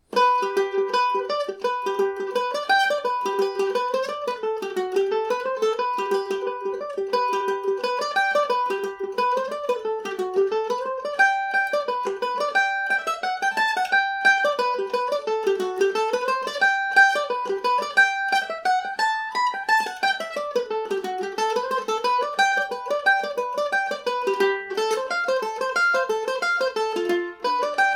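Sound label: reel, G major, irish mandolin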